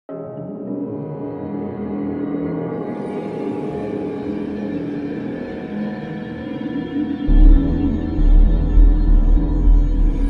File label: music awe and wonderment; Ioncross Total War